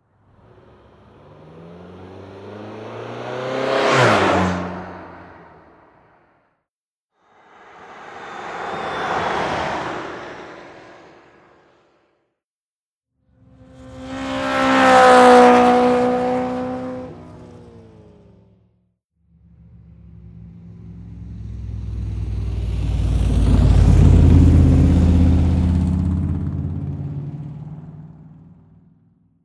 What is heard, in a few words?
Passing vehicles